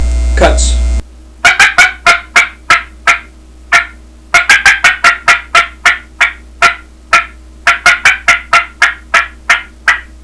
mini cutts, turkey; dixie

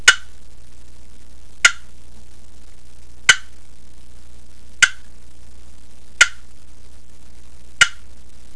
darlin clucks, turkey; dixie

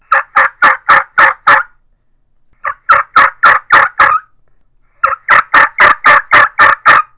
stingray mouth call yelps, turkey; Southland

